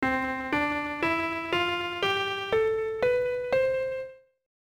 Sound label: Musical (Piano Scale); Pokemon Black and White